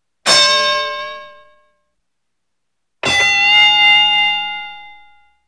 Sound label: Boxing Bells